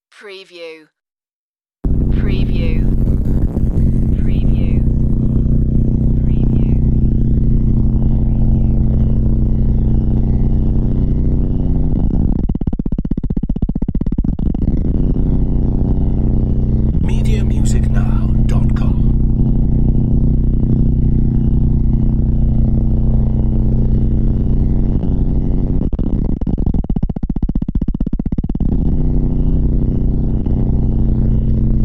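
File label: Deep Movement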